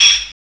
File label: TAMB; CMI Sound Library Rev 1.3